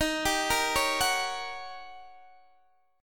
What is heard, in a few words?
Eb 7th Sharp 9th Eb, Guitar Open D; x,18,17,16,16,16; down